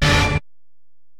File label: Bell, Alesis DM-PRO